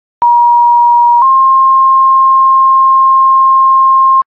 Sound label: page, tones